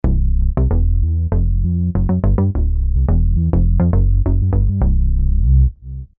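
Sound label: Double Kick Bass, Roland V-Synth; Factory Patches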